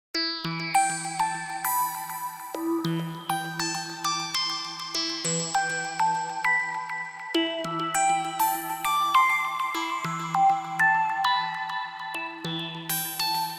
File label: Hip Hop Instrumental